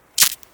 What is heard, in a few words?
spark